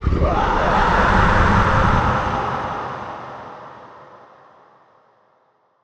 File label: Distant Absctract Scream, Monster Sounds; TheDeclineOfWarriors; Monster Sounds Volume II